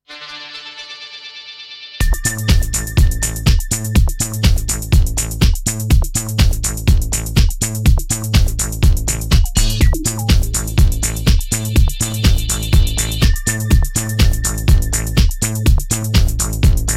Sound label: Disco Dub